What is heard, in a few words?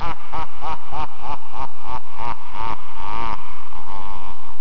LAUGH